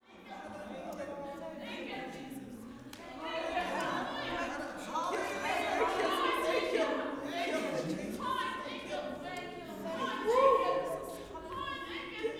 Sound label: WORSHIP, WORSHIPAMB, Vocal Planet, Gospel